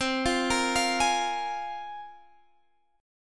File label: C 7th Suspended 4th Sharp 5th, Guitar, Open G